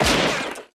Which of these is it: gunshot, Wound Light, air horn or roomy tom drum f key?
gunshot